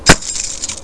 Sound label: Scrap Fleet Sound; SpacewardHo!